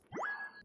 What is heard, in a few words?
drone ping; HLD